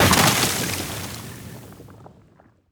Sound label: ice break